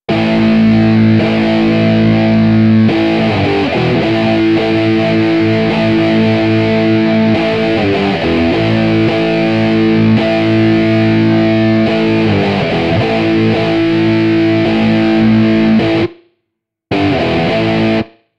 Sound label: Guitar Dub